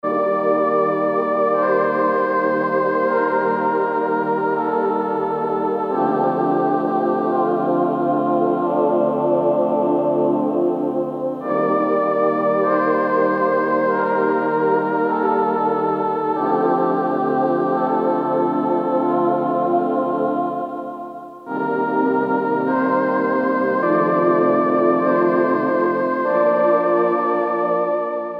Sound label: DWGS, Voices, Korg DSS-1; Voices II